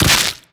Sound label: grab contact, barnacle creatures; Half Life Alyx